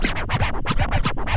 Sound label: Scratch; Club-50 - Foundations Roland